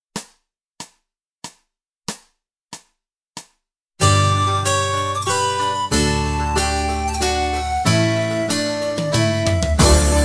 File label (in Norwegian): Fransk folksang slow